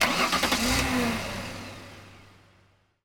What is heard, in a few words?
vehicle stationwagon ignition; teardown ps5 windows xbox series xs gamerip 2022